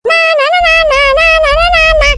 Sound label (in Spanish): cajero